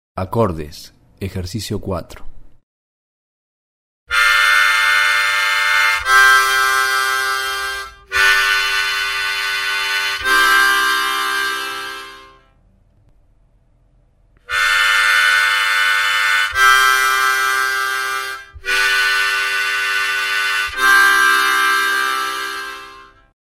Acordes Ejercicio

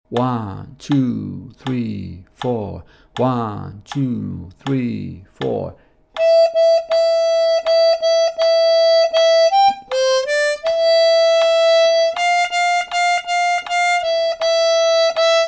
JINGLE BELLS, armonica

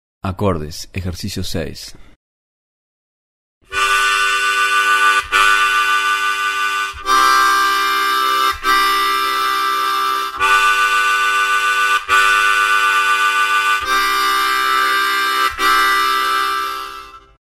Acordes Ejercicio

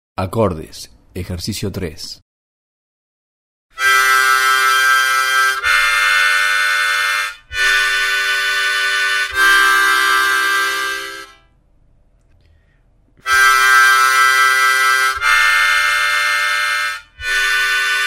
Acordes Ejercicio

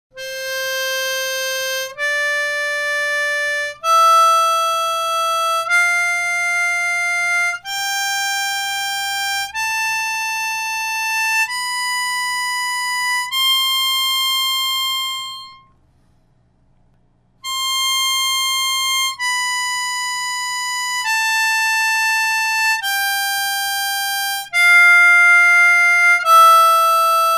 Ejercicios Escala Mayor de Do completa en el registo medio, armonica

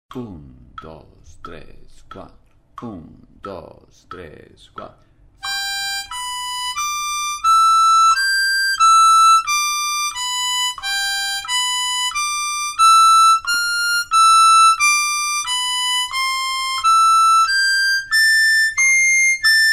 Boogie Blues 90, armonica

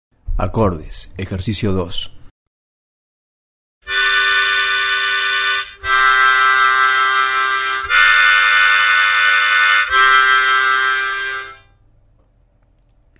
Acordes Ejercicio

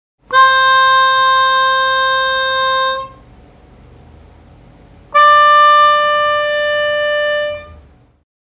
celda 4 soplando aspirando, armonica

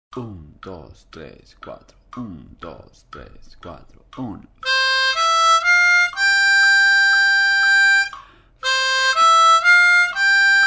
OH WHEN THE SAINTS, armonica

